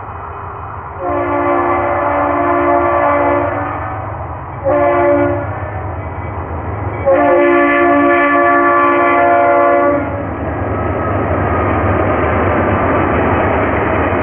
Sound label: train